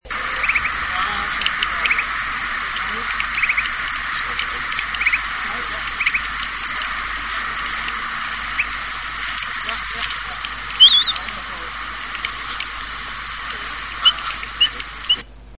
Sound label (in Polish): łuskowiec - nawoływanie